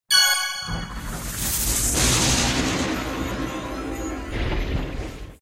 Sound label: level complete; bejeweled 2